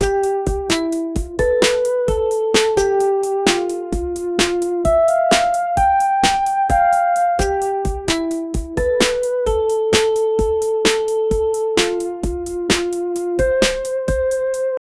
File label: create a warm uplifting a, childhood